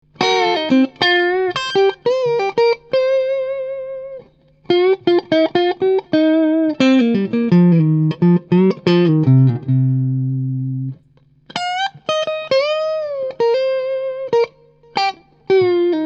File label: Hybrid Mid Pickup, guitar pickups